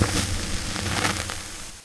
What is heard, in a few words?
dynamite light